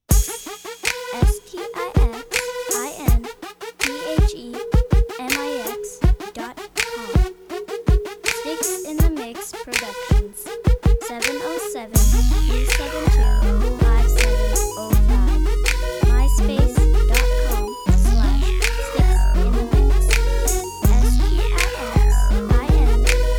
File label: crunk snap